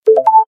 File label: camera click; Xiaolajiao; LA2-S